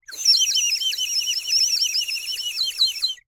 Bird whistle, clay, painted, water, airy, wispy, phrase, rapid, strong, overblown; Eiravaein Works; Vaeyan IV